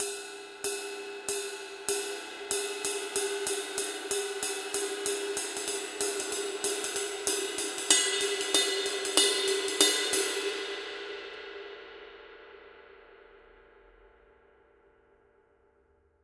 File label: 20 light ride pattern